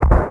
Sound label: expl far start, explosion; World of Tanks Blitz